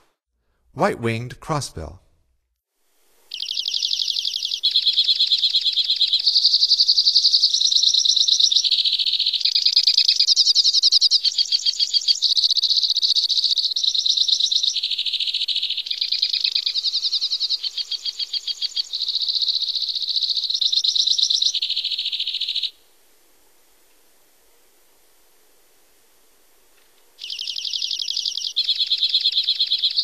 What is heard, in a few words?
White-winged Crossbill, Songs of Birds of North America; Stokes